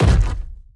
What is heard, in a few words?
Enemy Footstep; bloom minigame